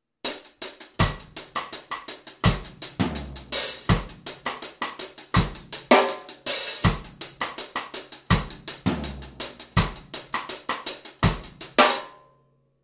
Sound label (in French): rythmes incontournable, batteur